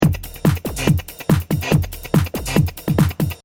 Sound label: Techno-Trance Drum Loop 142 Bpm; Stabber; LYN117 pack of techno-trance 142 BPM; Drum Loops